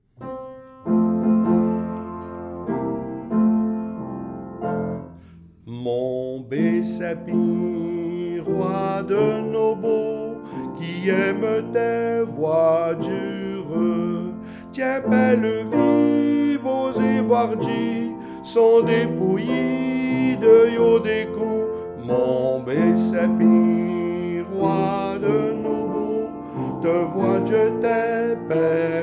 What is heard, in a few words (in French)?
mon beau sapin chant piano